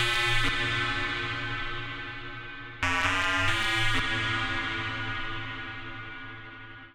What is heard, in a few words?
Juno Riff Bb-F-Gb-A, Grime, 138bpm; Zero-G Sound Sense Grime Scene; Construction Kits; Da Suspects